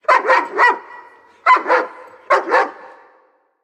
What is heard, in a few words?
Dog Chase Target; Prison Architect